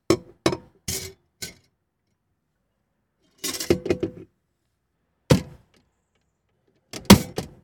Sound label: Coin Automat Giving Product Sounds